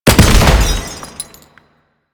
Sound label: Ammo Explosion; Ammo Ability; DE; Warframe; Frumentarius